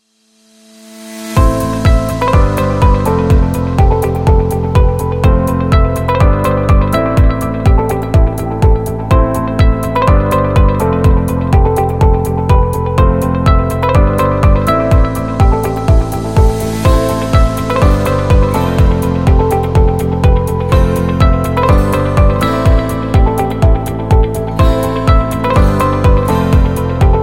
Business Corporate

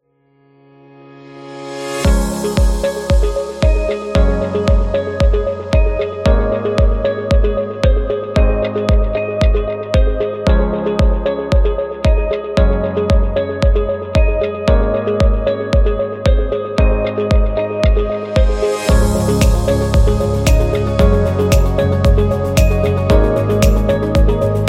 Corporate Music

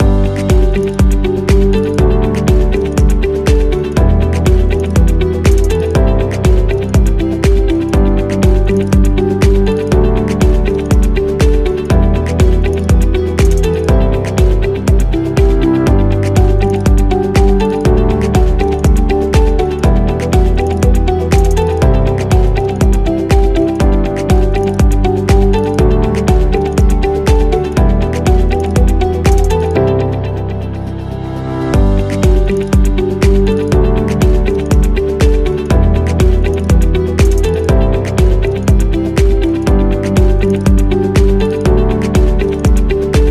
Corporate Loop Background; explainer

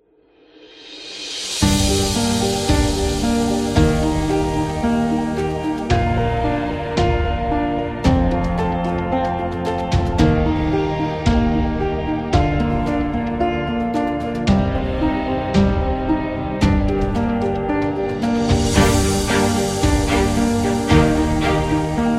Sad Romantic

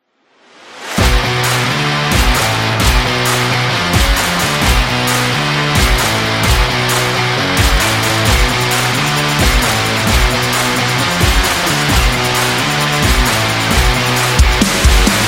Rock

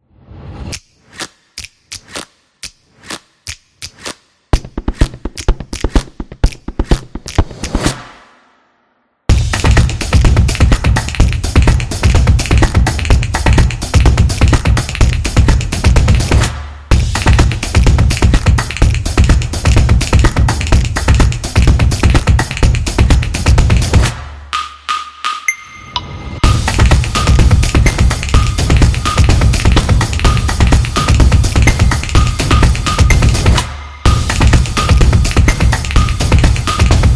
Snaps; fast tempo

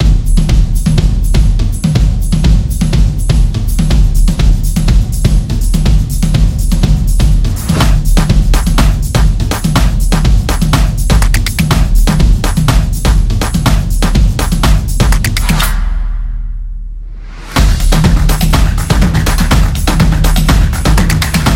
Drum Beat

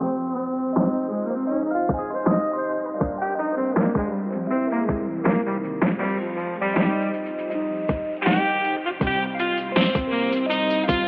Lo-Fi

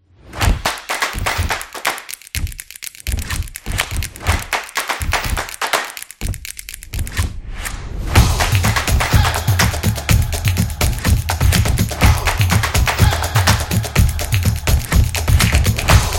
The Drum Opener

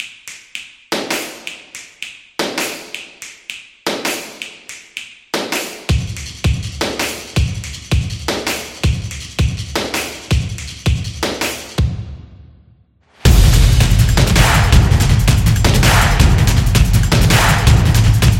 The Percussion Beat Drums

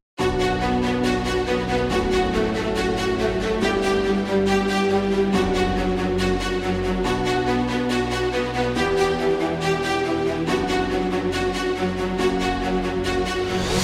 Epic Beat Hip Hop

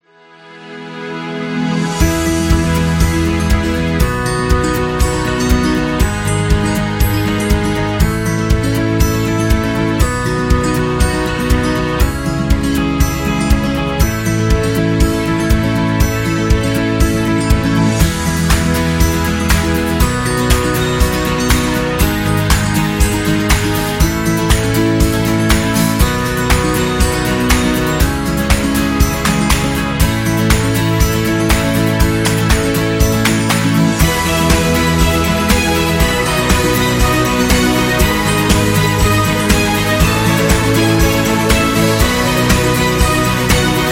Family Slideshow

